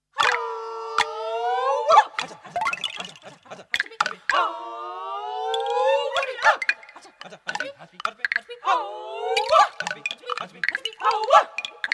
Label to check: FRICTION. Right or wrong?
Wrong